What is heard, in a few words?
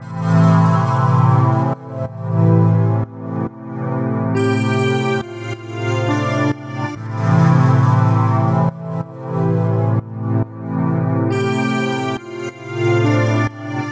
Bpm138 Sign Pad Loop Am, Dubstep